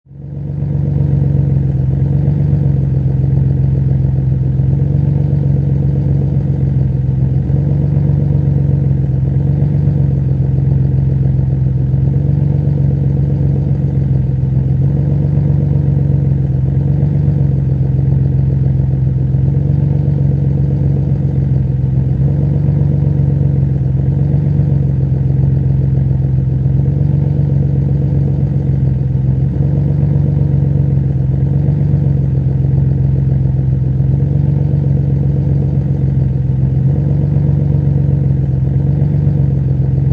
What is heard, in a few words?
Nissan 350Z Exhaust Idle, Auto, Power Car, High Performance Car Sound; Nissan 350 Z. Exhaust perspective. Engine idling, idle, ticking over.